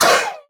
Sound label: hurt; minecraft; mob; allay